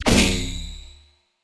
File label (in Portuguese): tiro sem arte